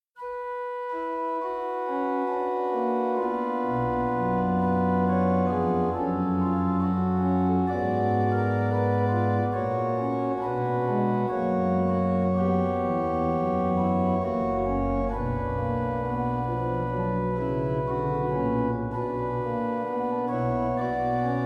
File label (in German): Nun komm der Heiden Heiland, Orgue; BWV 599; Orgelbuechlein; Enregistré le 4 avril 2020 sur l'orgue virtuel Klapmeyer de l'église St. Nikolai d'Altenbr